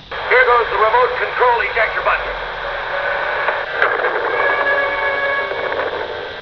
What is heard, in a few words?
eject; The EJECTOR SEAT; Actual 20th Century/Fox Special Effect sounds; BATMOBILE